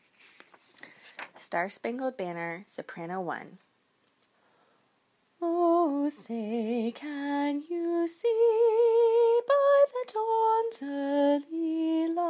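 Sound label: soprano; star spangled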